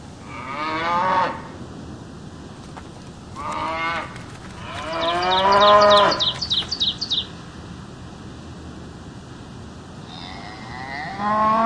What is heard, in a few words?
cows and birds; Zoo and Nature